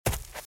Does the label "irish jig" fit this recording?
No